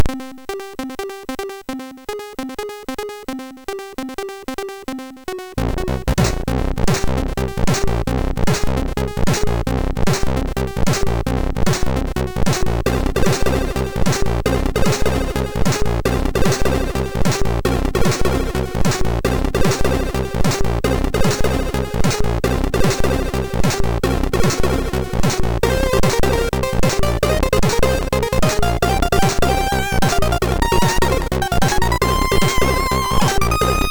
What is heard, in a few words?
Tribal, sid MOS6581R3